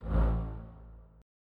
BUZZ

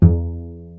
pizz cello e2; DirectX SDK; DMusProd

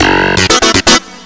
funk; Terra Sound Library; construction set

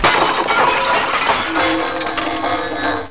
HEAP, robots

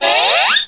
Clock Rises

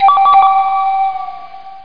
pizza ready; Kitchen Timer

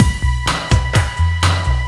Drum Loop

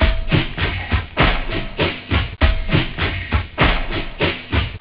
shaker, techno; OctaMED SoundStudio V1

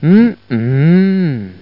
dhmmhmmm

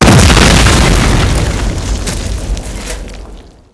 explo metal med; weapons; Spearhead Demo; MacFormat 2003 June

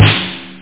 loose snare

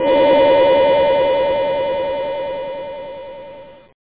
lost in space; C-C-Alpha

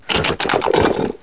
Chest; Bible Fun Pack; Education Publishing Concepts

